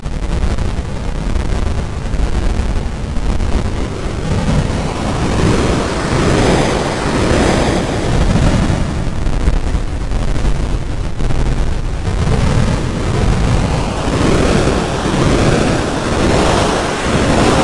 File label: static storm